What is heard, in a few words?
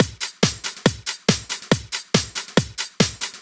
Addon Loop, Drum Loops 140bpm, Perc Loops; Zero-G Trance Inducer; 90 s Sample CDs